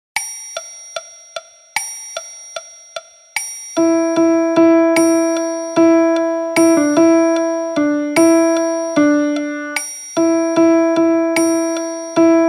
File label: Piano Version